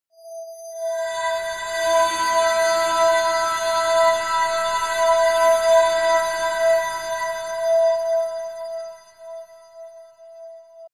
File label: scrapes ringing blitter harmonic